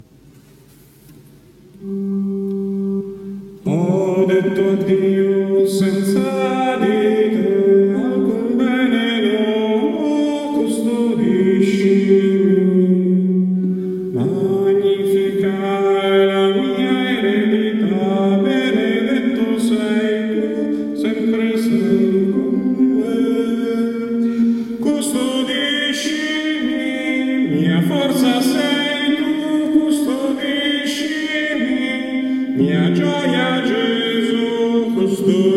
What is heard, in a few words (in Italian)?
Custodiscimi Soprani